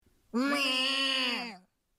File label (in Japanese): ヤギの鳴き声